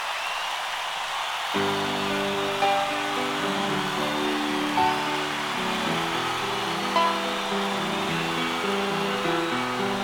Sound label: instrumental